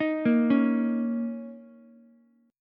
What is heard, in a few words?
A sharp Minor Double Flat 5th, Ukulele; arpeggiated; 8,10,9,x; JGuitar